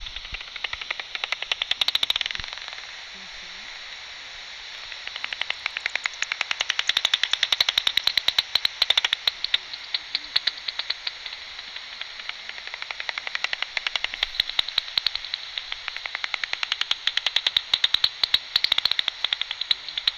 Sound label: bats